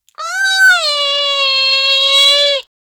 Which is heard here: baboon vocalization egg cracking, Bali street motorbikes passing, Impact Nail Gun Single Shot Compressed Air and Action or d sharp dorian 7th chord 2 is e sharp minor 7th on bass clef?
baboon vocalization egg cracking